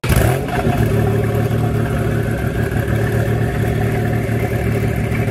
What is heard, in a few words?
Maserati; carface